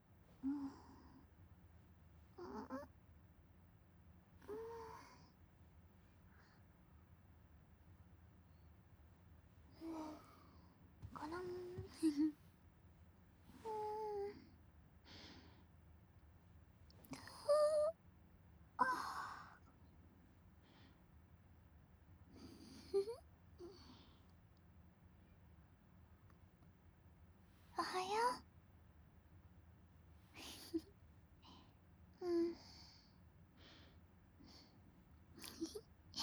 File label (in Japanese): もぞもぞフォーリー, ASMR; まったり寝正月。今年は家でおっぱい餅つき♪